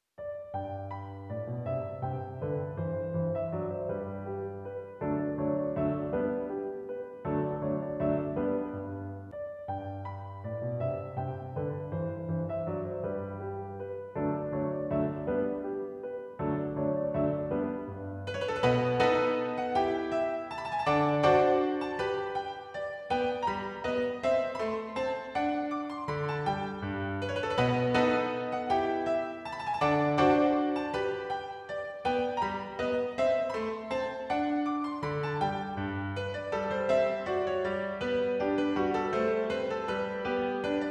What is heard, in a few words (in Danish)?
Vals for Pianoforte; VI Gallopader; af Beethoven